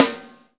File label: HI PIC RM, Drum Mashines, SR-16 DRUMS; EdgeSounds